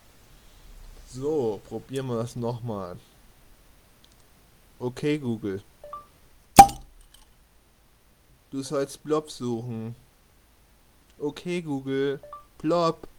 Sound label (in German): plopp